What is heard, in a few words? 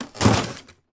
household freezer compartment drawer pull out open; sound effects one